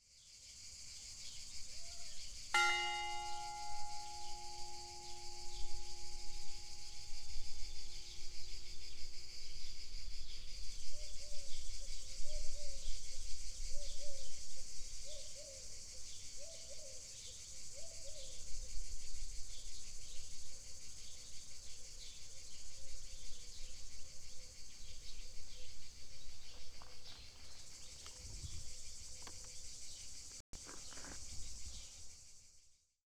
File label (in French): Horloge 13h; Flaux